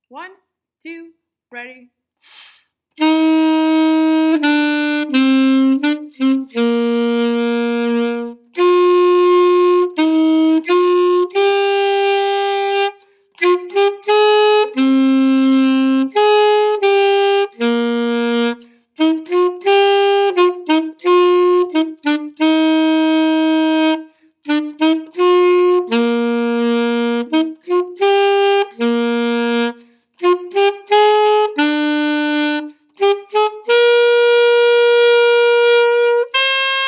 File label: gba sax